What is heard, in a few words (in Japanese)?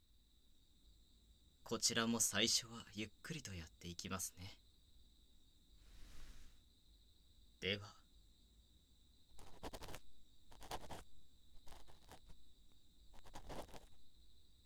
右耳かき, ASMR; アリヤレリフ～亡国の騎士～